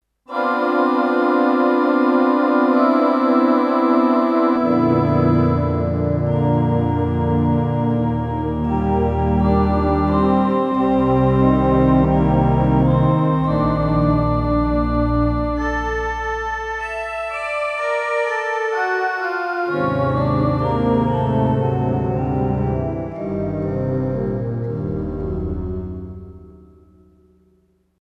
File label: Chorale Strings, Roland D-10; Factory Patches